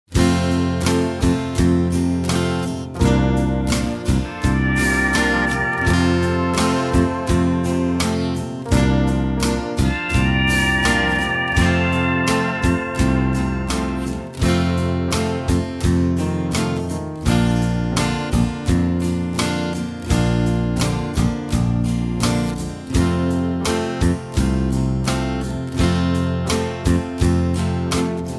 F No Backing Vocals, backing tracks

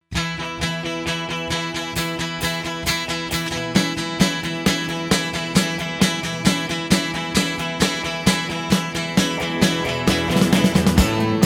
A No Backing Vocals, backing tracks

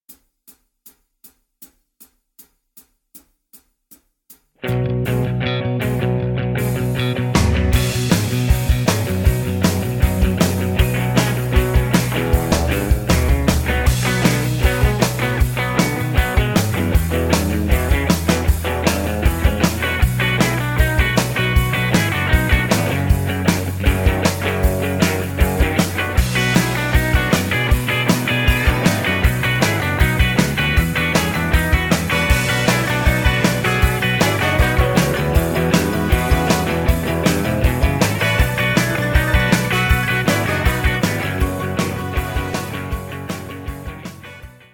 No Lead Guitar; B

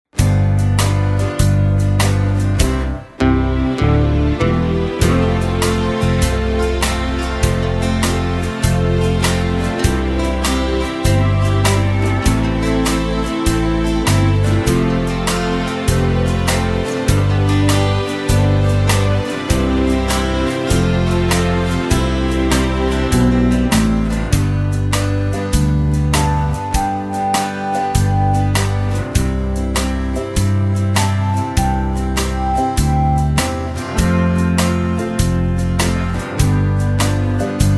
C No Backing Vocals, backing tracks

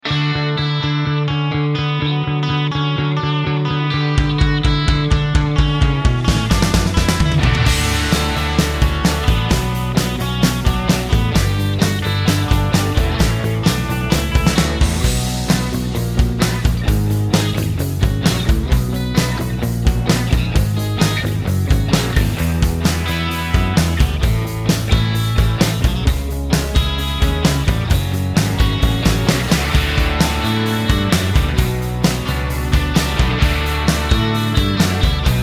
D No Backing Vocals, backing tracks

